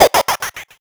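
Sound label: attack water cannon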